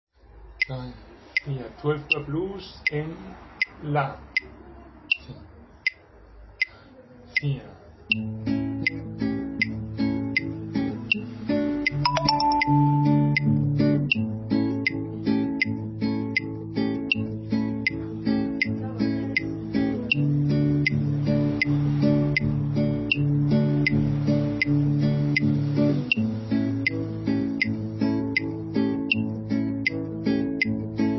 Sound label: Blues 12 bar progression in A, blues harp